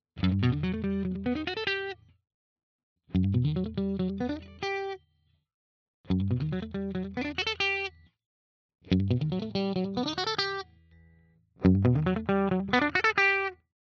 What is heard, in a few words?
clean Arps all positions; Jackson PRO PLUS Solist SLA3